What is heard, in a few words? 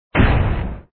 bomb explode; operation graduates